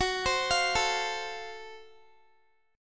Gb Minor Major 7th Flat 5th Gb Guitar; arpeggiated

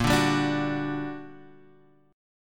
G Minor Major 7th, Bb, guitar chord

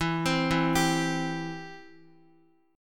E Minor Sharp 5th E down Guitar; Em#5 arpeggiated; JGuitar

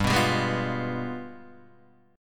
G 7th Flat 9th, Guitar, Open D

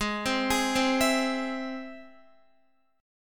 Ab Augmented Ab, Guitar, 4F, 4A, 5C, 5G, 5C, 6E; Listen to Ab+ arpeggiated; JGuitar

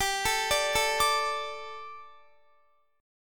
G Suspended 2nd G, down, Guitar; Gsus2; arpeggiated

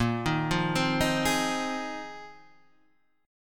A sharp 9th Suspended 4th A sharp; x,x,8,8,5,7,5,5

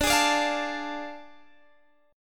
A Major 7th Suspended 4th Sharp 5th D

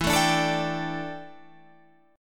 G Suspended 2nd E, Guitar; Gsus2/E; strummed

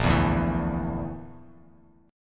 Bb Major Flat 5th Bb down Bass Standard, chord; strummed; JGuitar

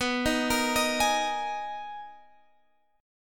B Major 13th B down Guitar Standard; BM13; arpeggiated; capo=2

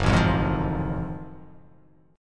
Gb Minor Major 13th, GbmM13 chord; strummed; JGuitar

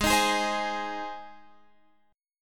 Ab Major Ab, Guitar Open F; strummed; JGuitar

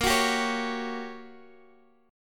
G Minor Major 7th Flat 5th, Bb

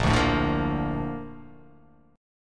Bb Major 7th, Bb, down; BbM7 strummed; JGuitar

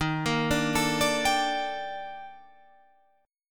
D sharp Major Sharp 11th; x,18,20,19,21,21,21,x; chordshape

